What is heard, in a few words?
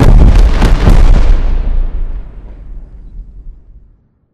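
explosion distant kaboom; corporate; Titanfall 2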